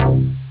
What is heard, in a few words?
techno, synth chords